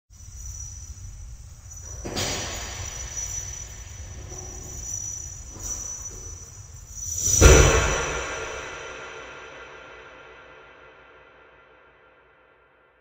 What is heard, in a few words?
shackles; غل و زنجیر